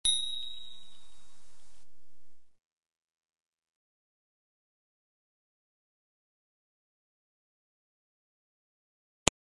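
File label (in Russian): колокольчик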